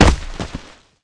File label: Rattle Snake